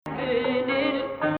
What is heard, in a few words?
Rast, maqam